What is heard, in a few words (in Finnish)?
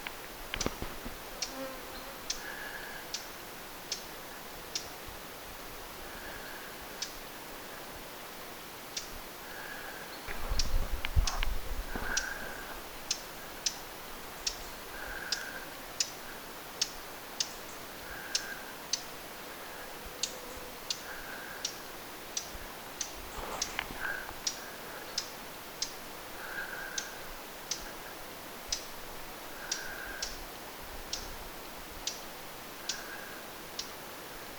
hernekerttu huomioääntelee tiheässä kuusikossa; paikalla joka sopisi hippiaiselle taikka kuusitiaiselle kuusikossa